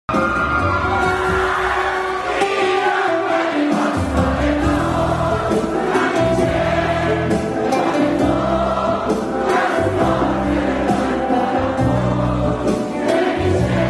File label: Gitar